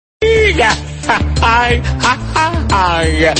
rap

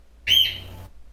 calopsita

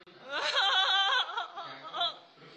risada

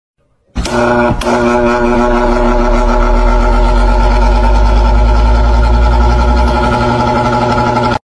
ventilador

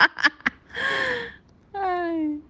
risada deboche

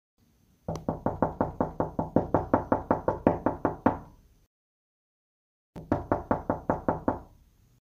toc toc porta

